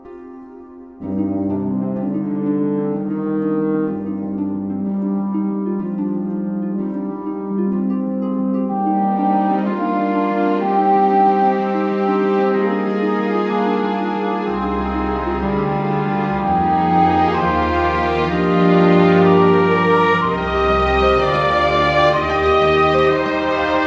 Instrumental

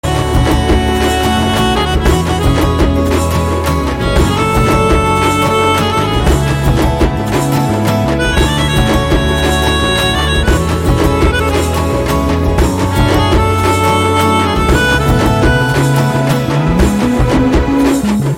love flute

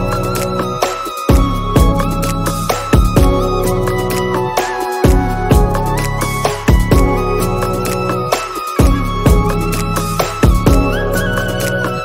Instrumental Ringtone

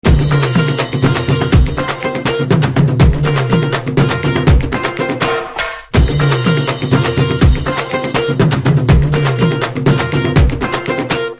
Drum Beats Ringtone

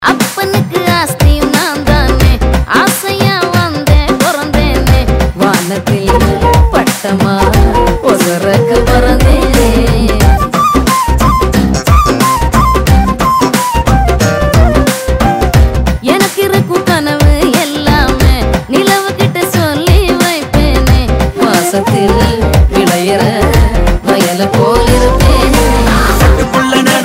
Female Version Ringtone

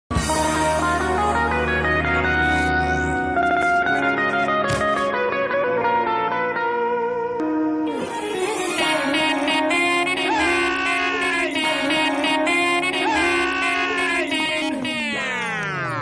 Retro Heroine Entry Bgm Ringtone; KGF 2

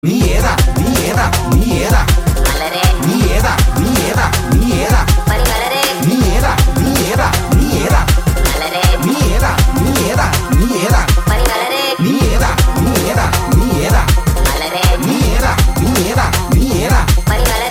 Rap Song; Naradan

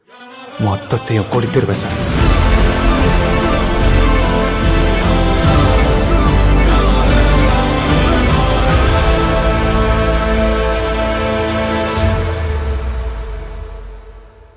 Telugu Bgm Ringtone; Vijay Deverakonda; Kingdom

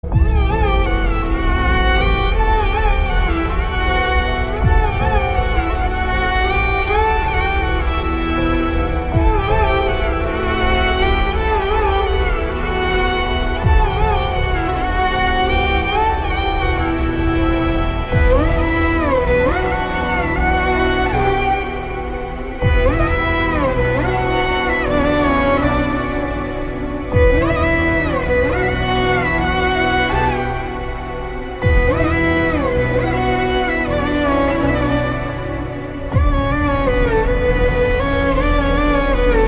Instrumental

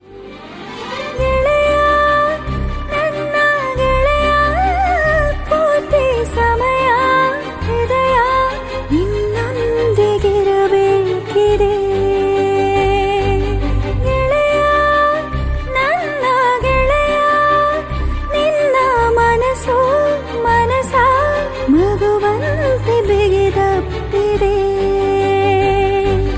Female Version Ringtone

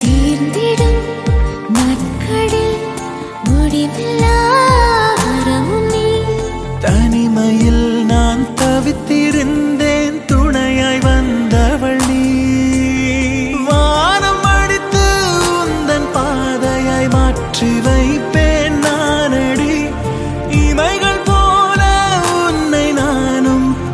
Female Ringtone

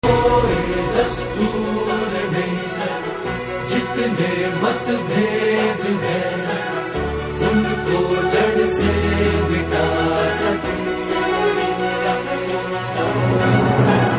BG Ringtone

